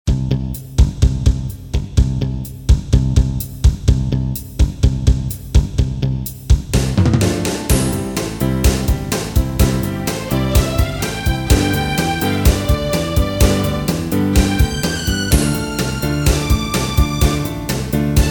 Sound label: 60s Soul, Casio CTK-601